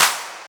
Claps; Vengeance; Essential Clubsounds Vol.4

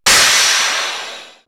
Metal Crash, perc fx; X-Static Goldmine

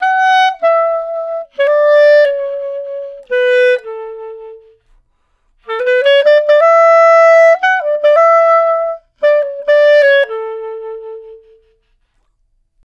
Clarinet One Shot, melody; Jazz Solos and Sections; Traditional; Clarinet Melody One Shots

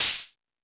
Crash, Drum Machines E-MU Pro-Cussion

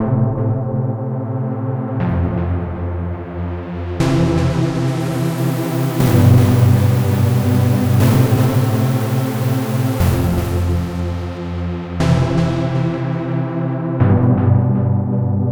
Trance Synth

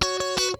Funk Guitar; 90 s Sample CDs; Zero G

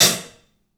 HAT, CLUBHAT, 3D Drums; 3D Sound

